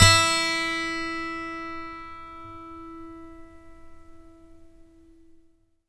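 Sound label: GTR E3 12; Synclavier Sampler Library; KEYS AND GUITARS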